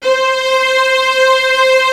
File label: Strings C4; E-MU Producer Series Vol. 8 – Platinum Phatt